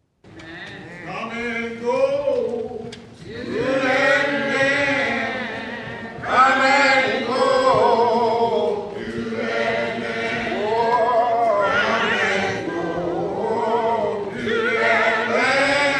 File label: African American Spiritual